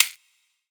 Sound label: ultrafast gambler mouseclick; DragonsLucky8WD; wazdan